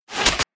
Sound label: arrow